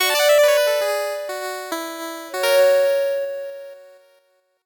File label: 8bit jingle fast